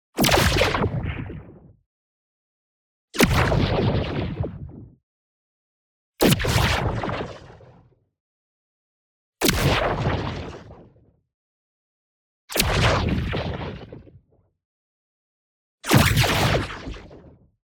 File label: IMPACT LARGE Plasma Ball; boom library; Matter